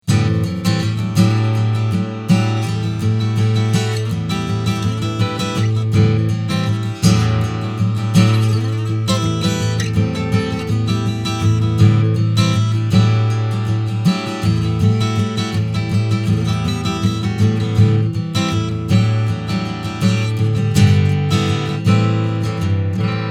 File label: Strumming Mic